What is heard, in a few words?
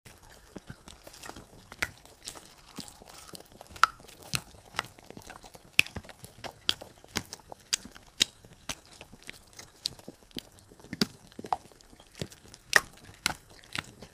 the sound of a panda, bamboo